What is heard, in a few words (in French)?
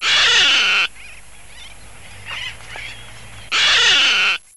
phaeton cri